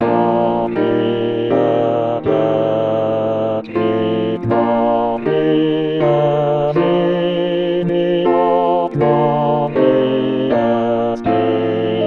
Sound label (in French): choeur bas; oratorio de noel choeurs